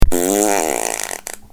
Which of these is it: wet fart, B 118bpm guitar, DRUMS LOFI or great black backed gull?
wet fart